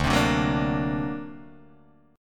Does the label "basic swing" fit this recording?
No